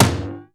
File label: MISC PERC; Crypton; DrumBase5000; PERC.COMBI